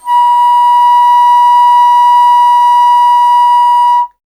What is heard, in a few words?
Alto Flute